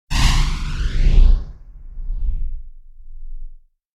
Whoosh Firing Phased; Hollywood Edge; sound designer's toolkit v2

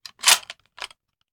Rifle Foley; Sound Ideas; Guns

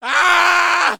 male scream; npc; DeadFright

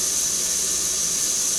Steam Loop

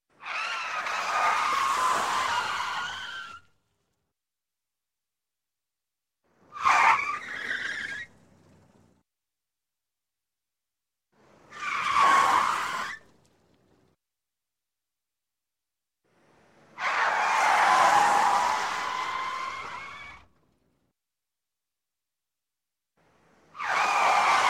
Auto, Skid Brake Skid, Tire; Sound Ideas 2000 Series

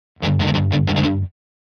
Guitar Fast Heavy Metal Rhythm; Hollywood Edge; sound designer's toolkit v2

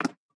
Peg Leg Footstep; Pitch Black - A Detective's Demise

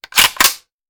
Machine Gun Foley; Sound Ideas; Guns

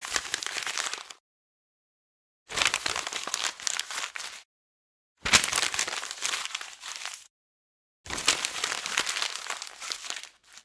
Organic Paper Crumble; boom library; The Interface

